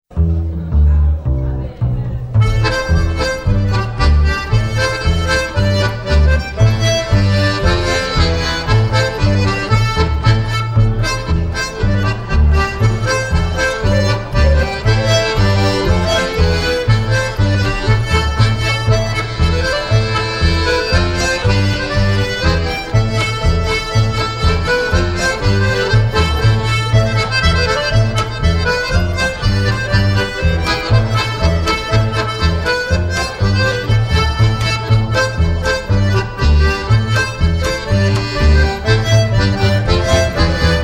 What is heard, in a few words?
polka